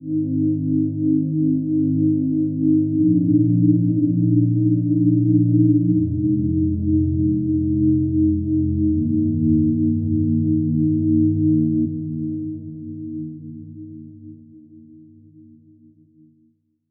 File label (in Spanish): Sample sonido PAD